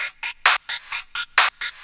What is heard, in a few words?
130 addon, DRUMLOOPS, HIHAT